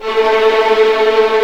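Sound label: A3 tremolo, String; East West; The Ultimate String Collection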